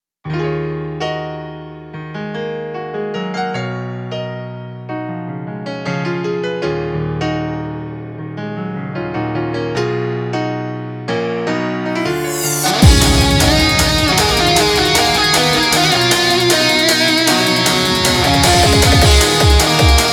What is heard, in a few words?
Instrumental